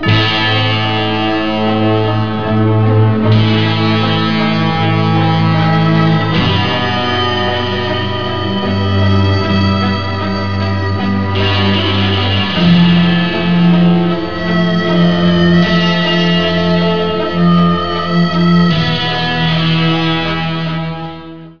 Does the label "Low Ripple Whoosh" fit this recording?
No